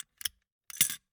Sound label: Gumball machine, candy door, plastic, open, drop, hyper; Eiravaein Works; Vaeyan IV